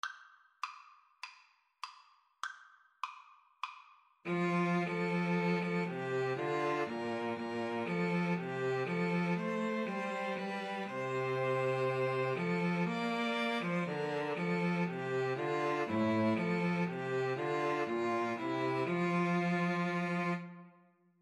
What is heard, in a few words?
String trio